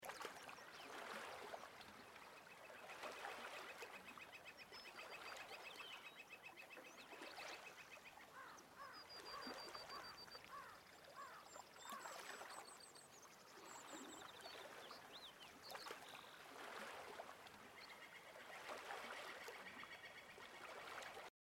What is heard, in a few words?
bgm